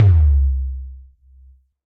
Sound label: Tom n Bass; Ableton; Skitter and Step